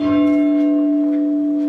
beddingham treble, bells

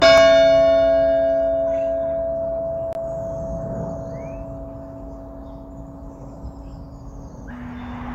five, bells; copthorne

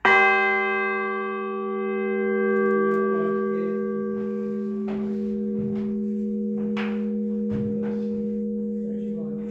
horsham, bells

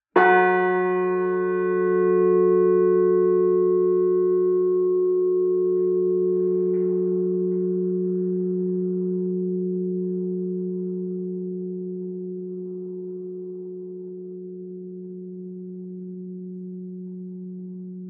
bells, fairwarp

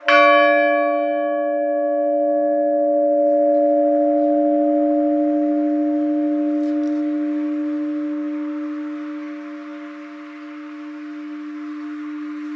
lower beeding old sharp fifth, bells

